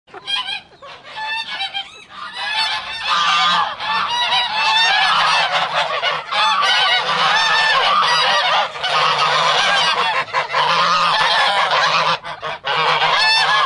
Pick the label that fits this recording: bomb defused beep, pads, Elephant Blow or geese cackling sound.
geese cackling sound